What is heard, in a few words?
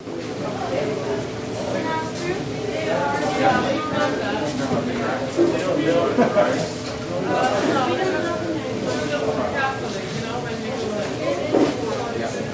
Restaurant Loop Fst F, restaurants & cafes; Restaurant Loop, Fast Food, Small Crowd